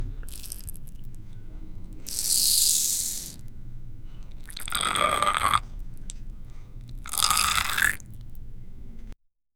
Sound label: gore and blood sounds